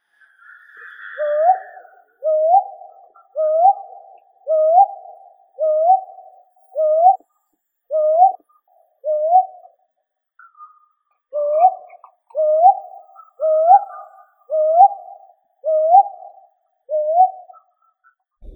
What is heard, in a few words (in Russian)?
sova iglonogaya ninox scutulata, zvuki prirodi